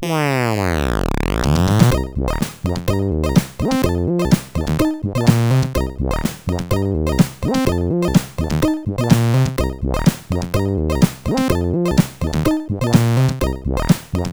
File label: Funky; Nordischsound